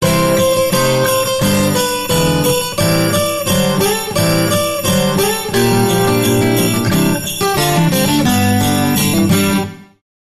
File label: Jingle Bells